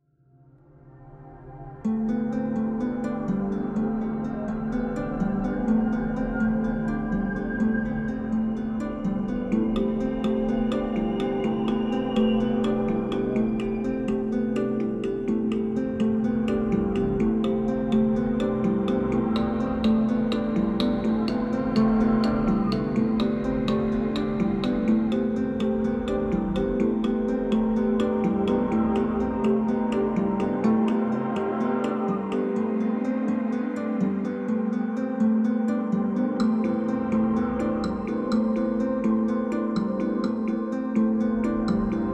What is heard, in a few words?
Atmospheric